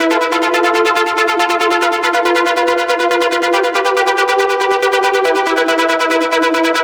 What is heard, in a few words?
NRG Arp, trance